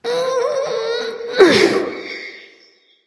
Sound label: grunt lift light; smite; voicepack; hel